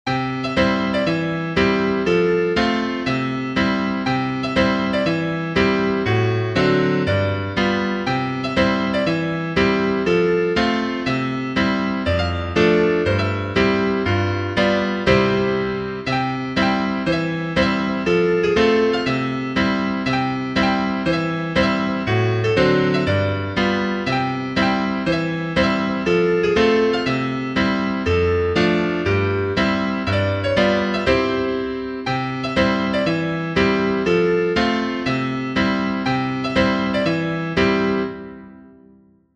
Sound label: PIANO